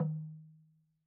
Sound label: Log Drum Hi, Slit Drum; Idiophones; Struck Idiophones